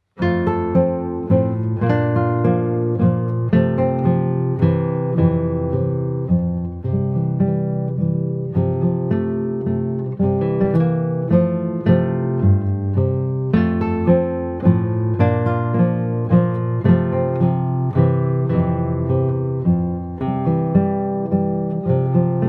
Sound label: Minuet, guitar